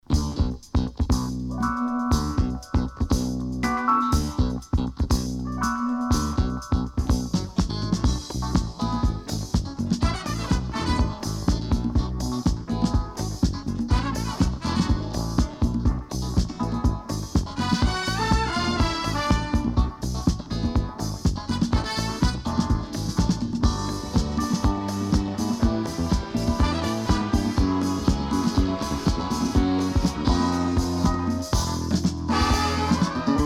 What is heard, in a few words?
Disco Version